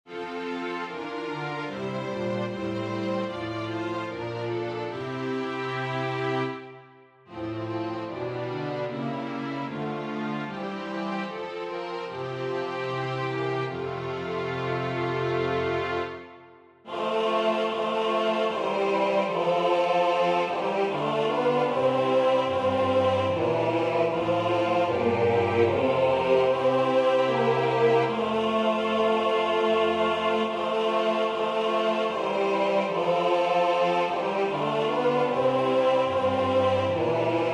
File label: tenor; Salve Maria - patrona Regina Rosarii